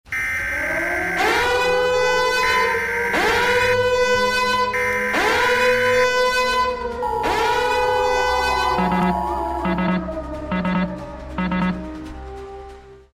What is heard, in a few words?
weeoo weeoo beep beep